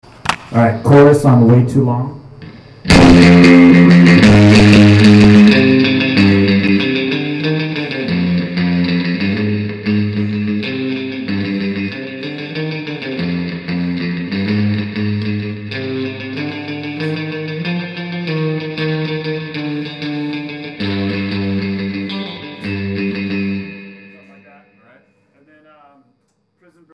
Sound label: bassline chorus; Tascam